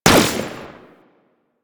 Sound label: Deth Cube Prime Fire, weapons; Warframe; Sentinel; Deth Machine Rifle